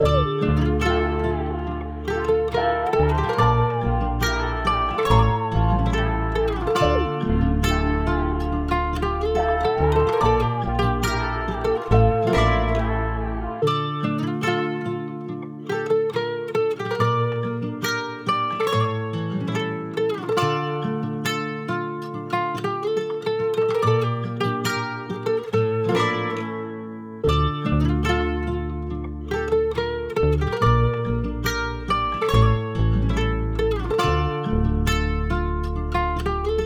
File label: 141 BPM D Min, Melodies; Cymatics; ZODIAC Beta Pack